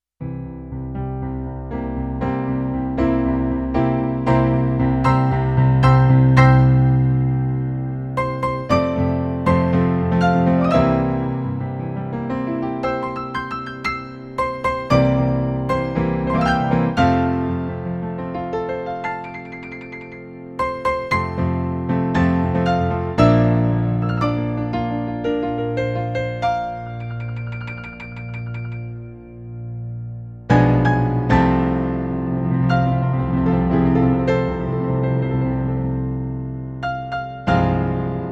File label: happybirthday, Piano Duets; the holiday collection